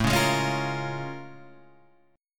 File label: Bb Minor 7th A; Bbm7/A strummed